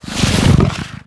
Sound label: spawn